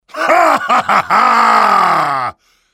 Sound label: Tableflip big laugh